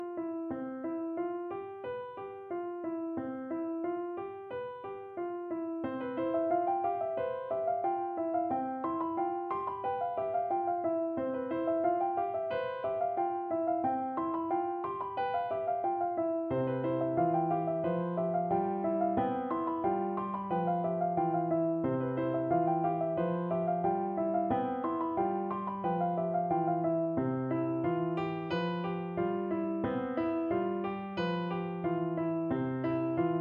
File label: Gamelan Dance; kammermusik